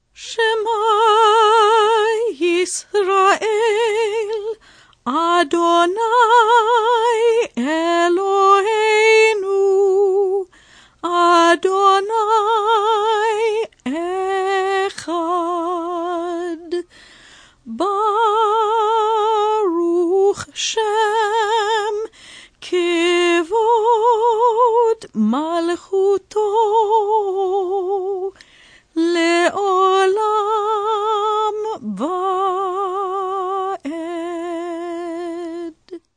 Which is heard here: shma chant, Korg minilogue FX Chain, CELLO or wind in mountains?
shma chant